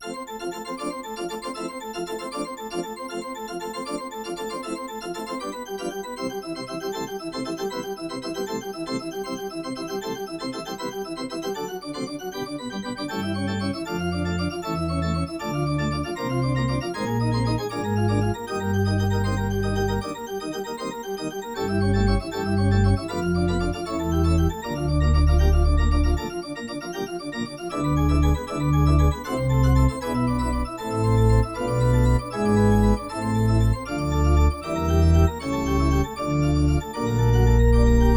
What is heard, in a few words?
church organ, Yamaha NP-30; Demo Songs